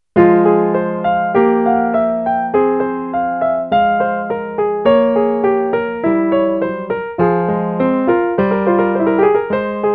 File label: piano prelude Fm; J.S.Bach (1685-1750), Das Wohltemperierte Klavier